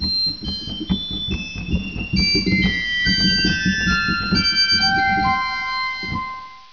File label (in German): Sifflet 1; Martin